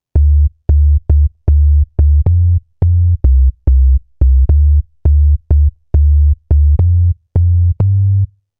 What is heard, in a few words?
Bassline Simple Sine